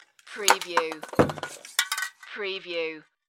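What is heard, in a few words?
Spray Paint Can Drop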